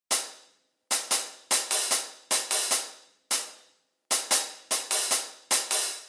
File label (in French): drums charley; trackplug finalplug